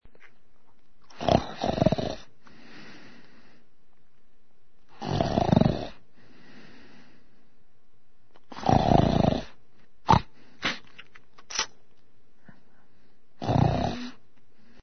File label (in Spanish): Roncar roncar